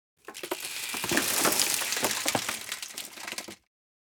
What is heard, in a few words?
Designed cinematic wood textures crunchy pressure